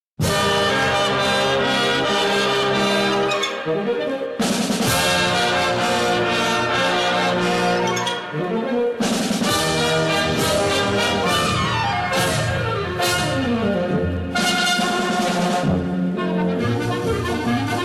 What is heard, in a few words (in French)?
Danse des clarinettes